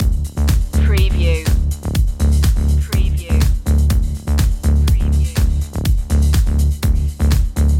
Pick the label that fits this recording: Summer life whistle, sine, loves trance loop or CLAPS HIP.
loves trance loop